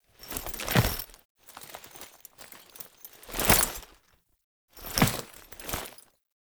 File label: Idle Noble Bow; Warframe; Dagath